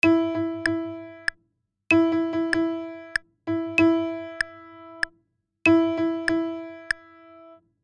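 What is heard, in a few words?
Three quarters rhythm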